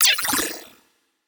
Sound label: gambler win; Magic Stars 5; Wazdan